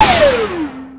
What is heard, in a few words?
Blim, Drum&Bass Hits & Bits; Total Drum&Bass; 90 s Sample CDs